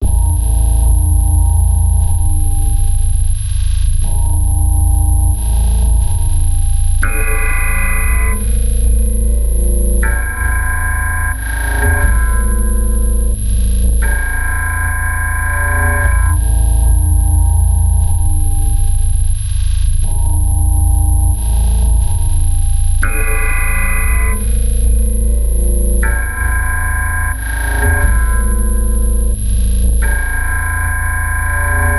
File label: fragile glass bridge